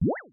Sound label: eat